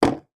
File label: technology headphones large down on table; sound effects one